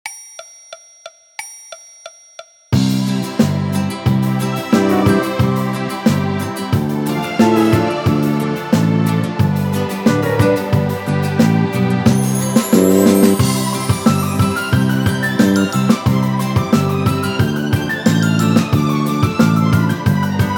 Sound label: Orchestra Version; Jingle Bells; جینگل بلز